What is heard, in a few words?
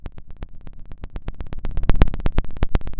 pop, Sound of a fire crackling